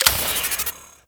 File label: Experiment Pistol Reload, Weapons; Warframe; Corpus; Sent Experimental Pistol